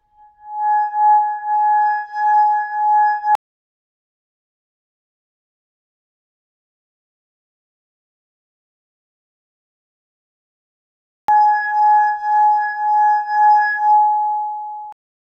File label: Crystal, Glass, Tall Narrow, Tone, Rubbing, Musical; Raconteur Sound; Crystal Glasses- Tones and Drones